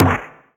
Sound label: Percussions